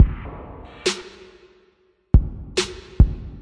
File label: 140 bpm verby kick bap trap; 131 to 140 bpm; samples-ghost